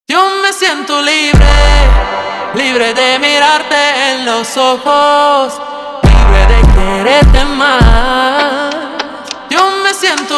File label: Hardstyle slowed, funk